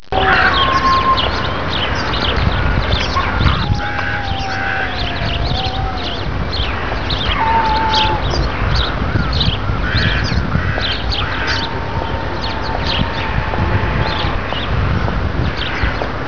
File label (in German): SPATZEN